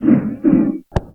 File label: sling big; wesnoth